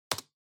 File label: PC-Keyboard (Enter-Hard)